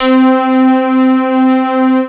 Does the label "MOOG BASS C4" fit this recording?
Yes